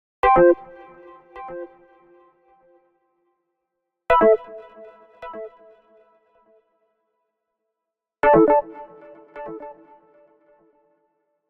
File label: Arcade Jingle Neutral; boom library; The Interface